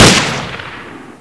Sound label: gun shoot